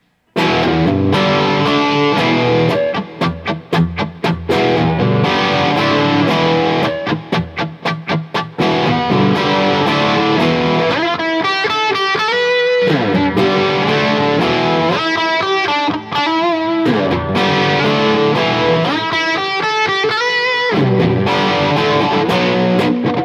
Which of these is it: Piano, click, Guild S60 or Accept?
Guild S60